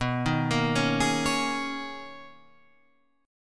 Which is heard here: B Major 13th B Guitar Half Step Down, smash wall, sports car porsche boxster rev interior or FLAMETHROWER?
B Major 13th B Guitar Half Step Down